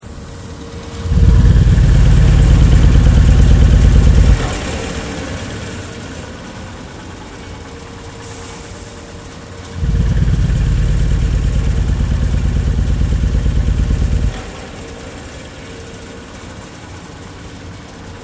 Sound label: Industrial Machinery Unknown Cyclic Operation